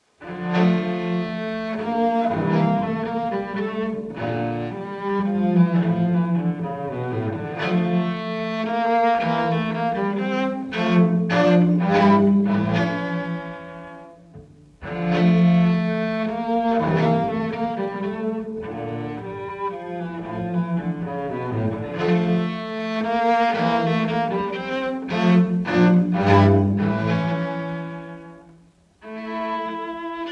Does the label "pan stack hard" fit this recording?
No